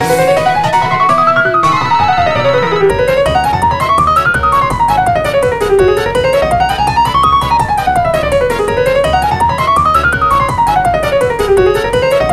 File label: Music Park (Final Lap, Piano); Mario Kart 8